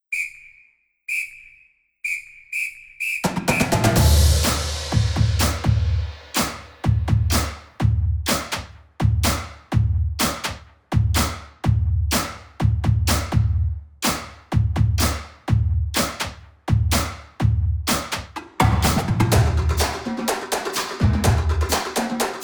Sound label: Blessed Chant Theme Accompaniment; the beatitudes preschool spring